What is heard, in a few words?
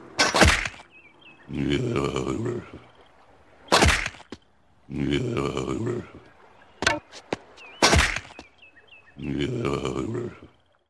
rake